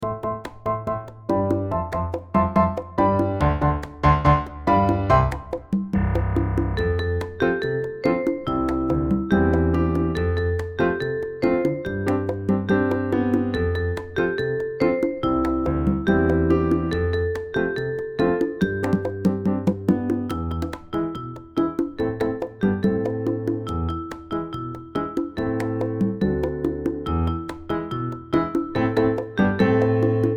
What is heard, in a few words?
Piano Vocaline 8va